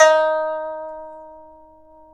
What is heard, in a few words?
PIPA D#3; INIS; Opium